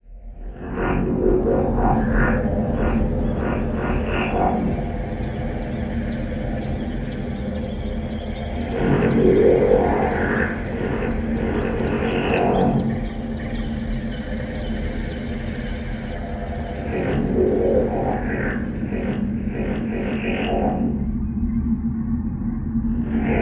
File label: Rover Drone Stdy Low Ton, granular